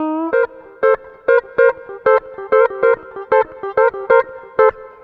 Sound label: RHYTHM TWANG; Bass; Sonic Foundry (Sony Creative Software); Not Just Another Pretty Bass